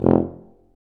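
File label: brass C tuba, staccato; Solo Brass; Roland LCDP12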